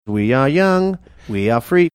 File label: singing we are young we are free